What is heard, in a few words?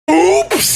oooooooopzzz